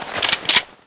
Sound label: draw shotgun; pyro; tf